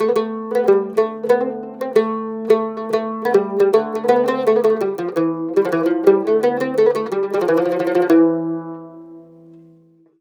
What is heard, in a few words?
CUMB, CUMBUS, Mediteranean Traditions